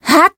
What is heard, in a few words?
Voice attack; nagi